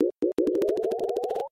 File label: Money Drop